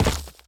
dirt hit

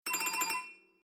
Flutter

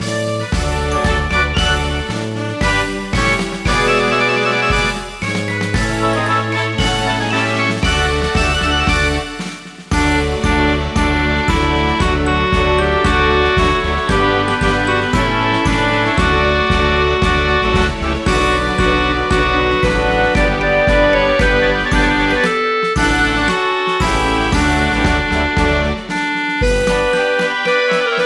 Music Menu event

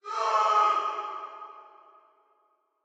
Shout Distant; Prison Architect

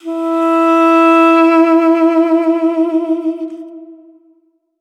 Duduk; quern undying thoughts linux macos windows xbox one gamerip 2016

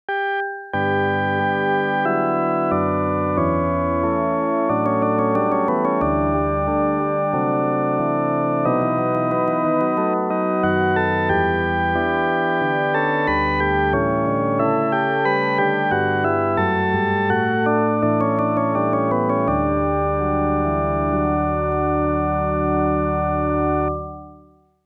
Hymn; Splatterhouse

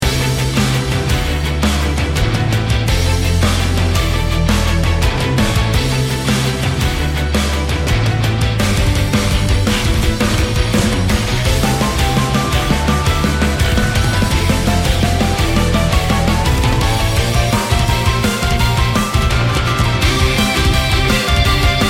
Battle Loop; Mist Train Girls

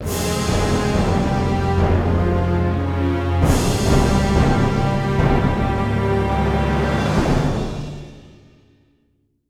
Fail; Heroes Over Europe

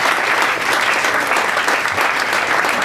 ambience, applause small; birthday party bash; wii

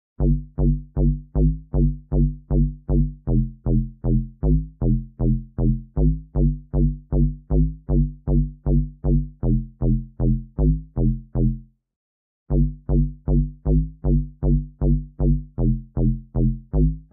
Bassline; chippy

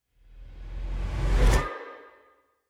shock reverse; Joe's Diner

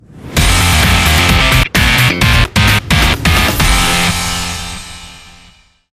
Music Game General event

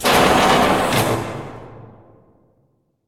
Jail Door Large Open; Prison Architect

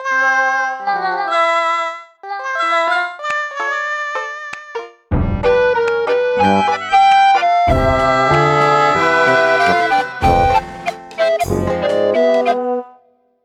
Beats; Sparklite

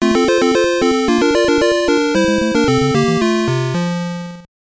Wave Start; Krazy Kreatures; NES

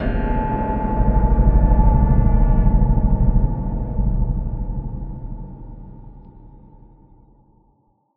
book Scare; Perception; PS4

